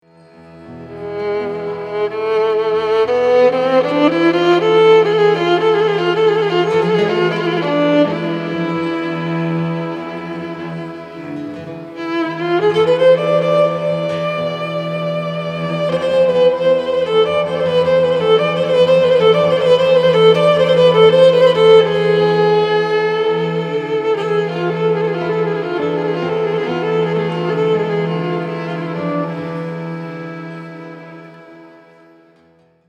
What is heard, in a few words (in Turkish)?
Keman Taksim